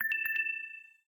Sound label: Low Battery